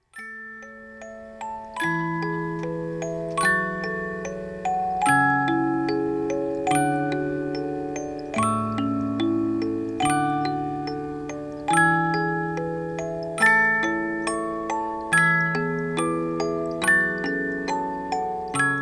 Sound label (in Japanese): canon normal, musicboxes